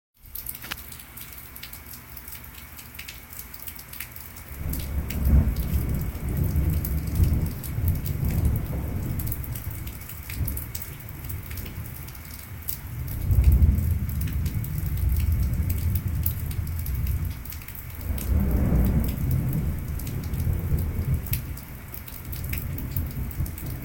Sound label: Relaxing Rain